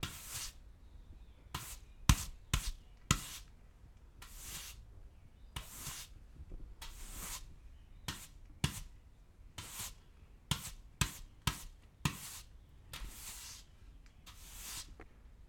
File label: Sweeping